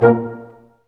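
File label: BRS Large Ensemble stac; Roland L-CD702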